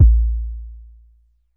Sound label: DEEP KICK, DRUMS; Music Store Sound Service; Einstein